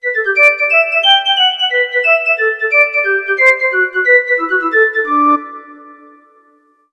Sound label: Synth flute